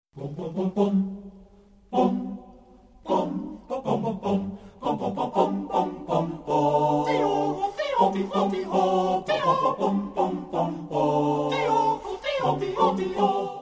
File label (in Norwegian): Jodel